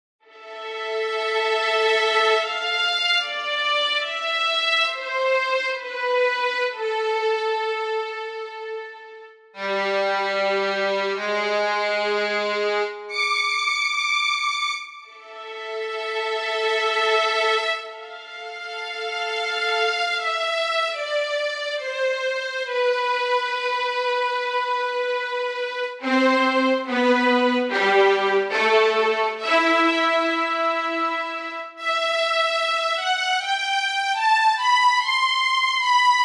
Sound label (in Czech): violini celek